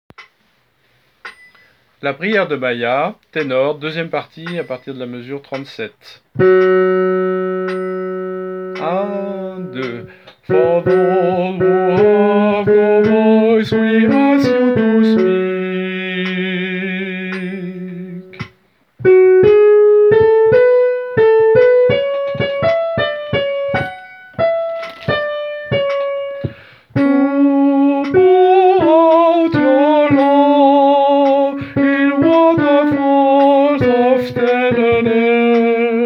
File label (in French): ténor